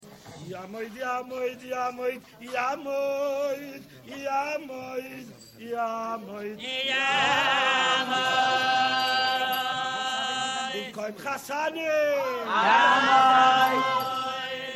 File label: Niggun Rikud